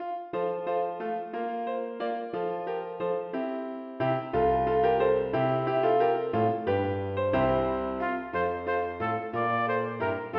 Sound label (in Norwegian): Paspied for trompet og piano; Aamodt